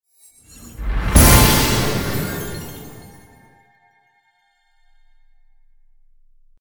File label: win money, casino